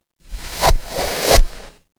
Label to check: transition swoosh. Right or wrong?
Right